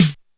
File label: Snare, Korg DSS-1